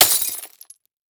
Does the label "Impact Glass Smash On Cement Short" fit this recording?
Yes